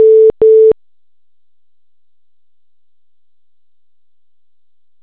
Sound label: call waiting; baresip